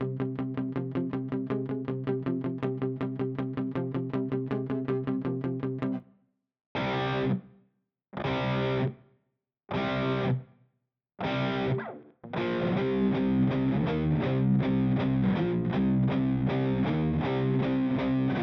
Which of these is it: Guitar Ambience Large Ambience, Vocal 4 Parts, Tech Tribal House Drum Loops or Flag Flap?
Guitar Ambience Large Ambience